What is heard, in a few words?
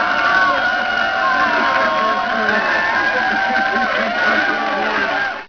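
Crowd Sound; Sir Richard The Lion Hearted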